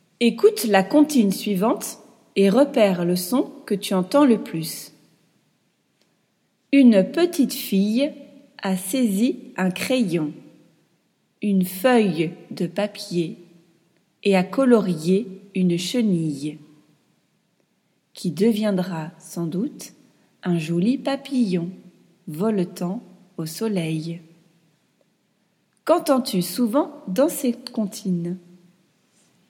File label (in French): comptine; son j